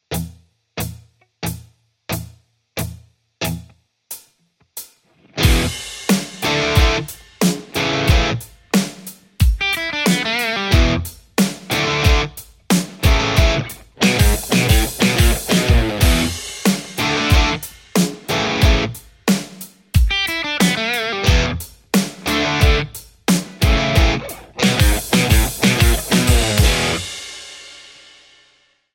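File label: Stratocaster; poulin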